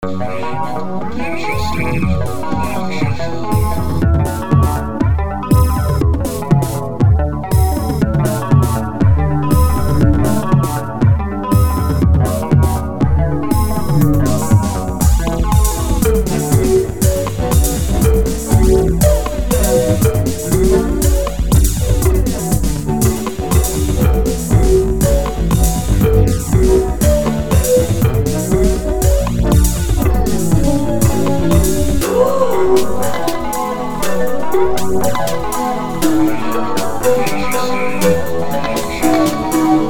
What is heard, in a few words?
samba